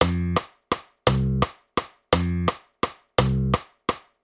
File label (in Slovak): Basove figury valcik 3/4 doby